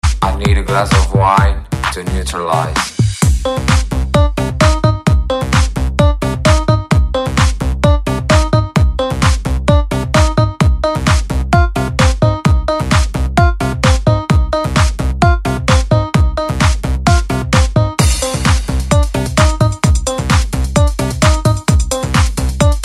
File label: Rusty Guitar